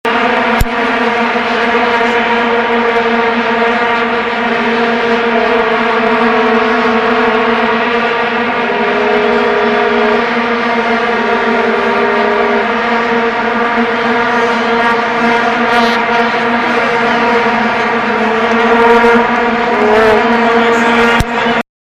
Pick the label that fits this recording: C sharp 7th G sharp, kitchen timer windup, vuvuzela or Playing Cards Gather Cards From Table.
vuvuzela